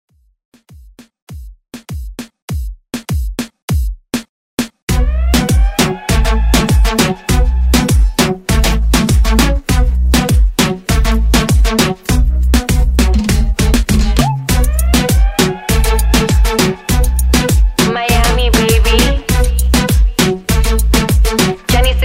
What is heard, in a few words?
Moombahton Redrum